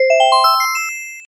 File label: game complete